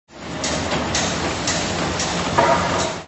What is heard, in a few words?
Big Construct; red cross eru